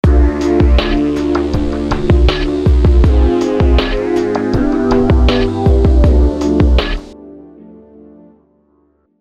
Roland XV5080

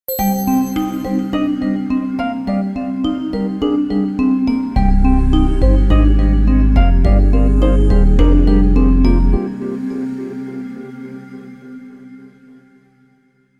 Roland Fantom XR

